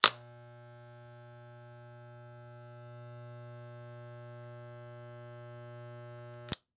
Household Trimmer Turn On And Off Electric Hair Trimmer